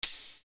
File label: Percussion, EuroDance